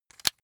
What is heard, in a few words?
razor rickety fold up hard